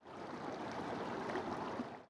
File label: ambient battle; World Of Warships